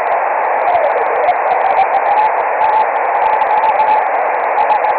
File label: 10ghz, rainscatter; microwave